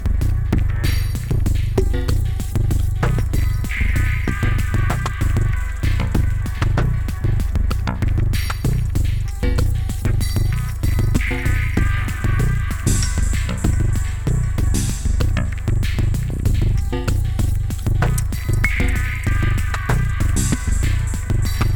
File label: bass